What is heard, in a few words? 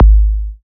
Minimal Kick, Drums, Kicks